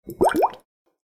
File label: potion loot; Keep Out! A Dungeon Adventure for the Brave